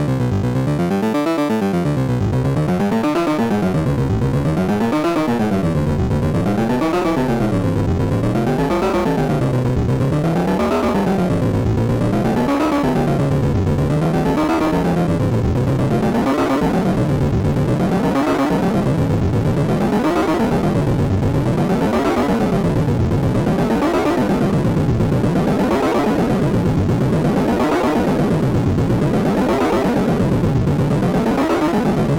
Sonicware ELZ 1 DNA Explorer Synth EXPLORE Modulation